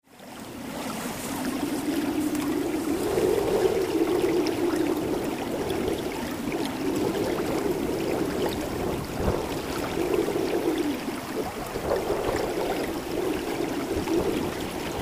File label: southwold ripples